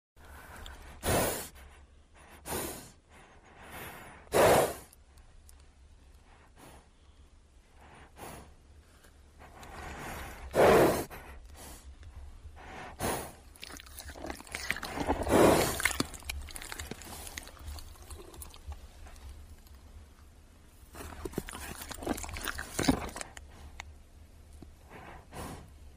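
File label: BREATHING LICK close up; wildlife sounds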